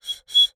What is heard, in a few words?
Bird whistle, clay, painted, dry, airy, wispy, burst, light, double; Eiravaein Works; Vaeyan IV